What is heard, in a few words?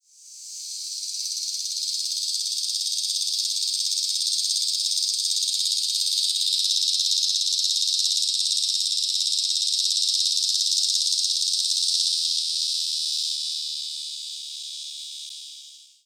Megatibicen tremulus fragment filtered; KS; cicadas